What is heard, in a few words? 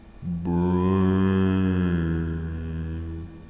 zom ambient